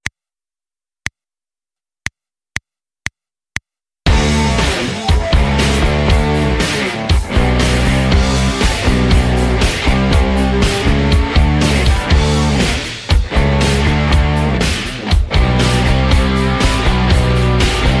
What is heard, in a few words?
backing track